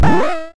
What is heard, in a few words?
ropes